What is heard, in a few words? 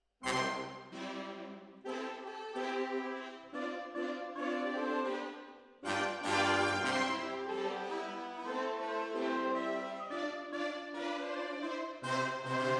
Hall, Jazz for piano